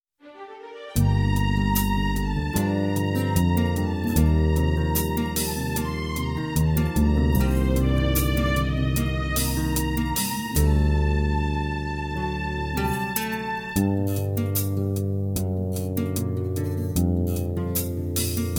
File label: brass minus